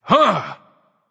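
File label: nonverbal surprised; smite; voicepack; merlin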